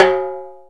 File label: Timbale Lo Rim, drum machines; E-MU Planet-Earth